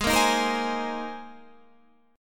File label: C Minor 7th Sharp 5th, G sharp, chord